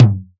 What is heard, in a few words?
Tom; Drums